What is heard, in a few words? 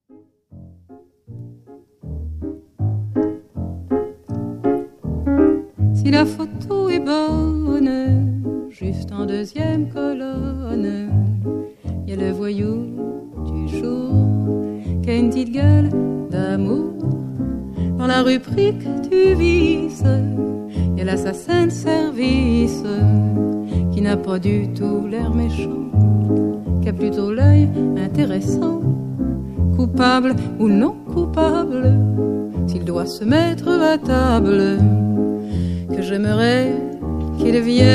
French Cafe